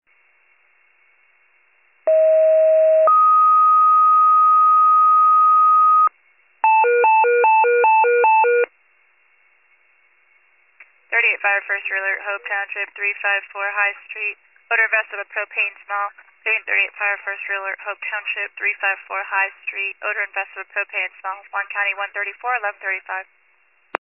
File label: FIRE pager alert; 38 FIRE